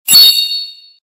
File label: Beep; i-mobile; i-note-IE6010